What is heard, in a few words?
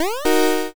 enemy appear